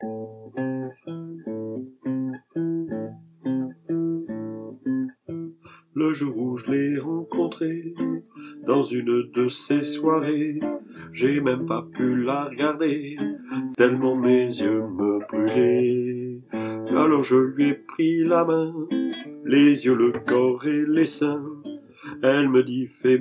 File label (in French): suzette tenor, chorale